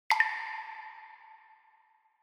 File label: Water Drop High Reverb